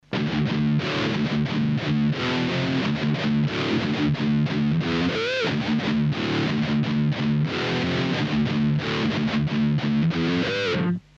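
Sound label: MXR DynaComp Distortion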